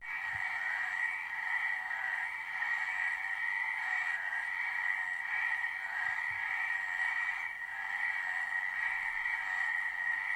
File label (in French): rainette meridionale